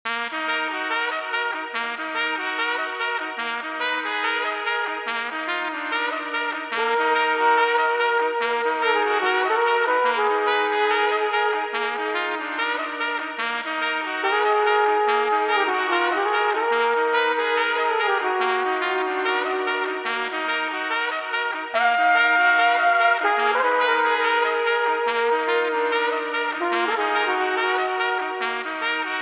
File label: stadium brass; kennedy sabin